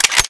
mp5 slide back, sten

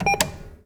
press, atm

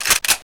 m3 pump, benelli m3

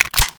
mag in, ak; weapons

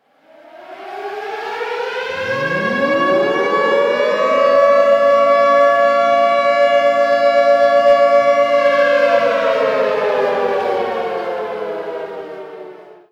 air raid

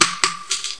shell, 1897 trench; weapons

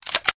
p90 cock; weapons; p90 smg

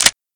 model500 clip in; weapons

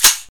m4a1 bolt release; hk416

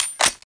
ak47 clip in; fg42; weapons

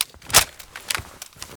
sg550 clip in; m14; weapons; fokku tc m14

